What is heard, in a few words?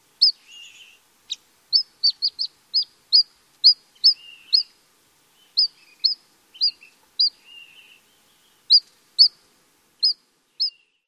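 pygmy nuthatch calls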